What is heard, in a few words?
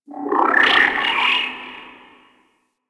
Ship Data Chatter; Ambience; Warframe; Corpus Ship Remaster